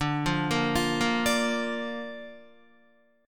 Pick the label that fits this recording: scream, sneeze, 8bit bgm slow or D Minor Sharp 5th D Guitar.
D Minor Sharp 5th D Guitar